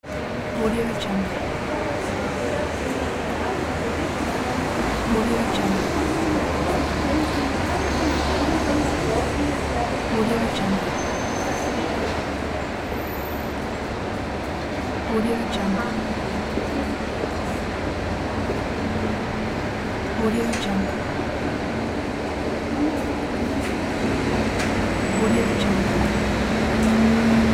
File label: city street